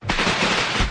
鞭炮

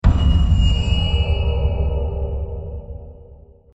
恐怖音效; 怪物来袭

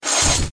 小刀划开

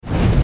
火焰

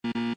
错误

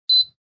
空调

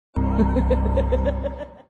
阴笑

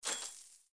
钱币入账

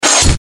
挥刀

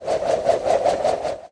飞饼发射; 武器音效; 老婆飞饼发射

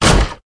踢门

